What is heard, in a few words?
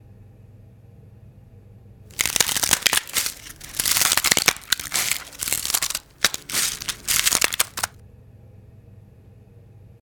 Breaking Ice In Plastic Ice Tray